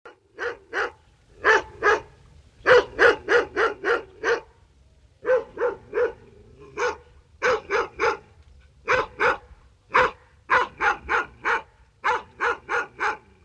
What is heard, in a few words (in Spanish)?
otro perro ladrando